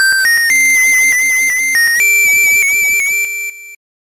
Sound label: whistle; rockman7; blues